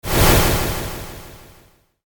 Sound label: element fire; maoudamashii